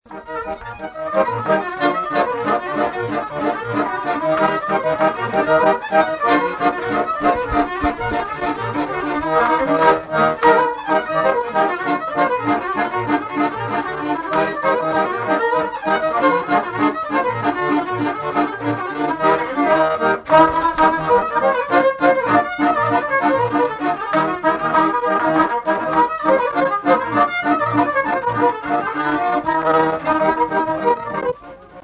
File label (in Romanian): Sarba la taraf; jocuri si cantece batranesti; Vaslui